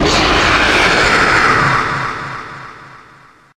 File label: jumpscare sound; fnajazl; minigame